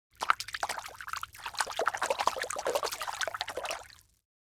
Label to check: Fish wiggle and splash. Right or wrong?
Right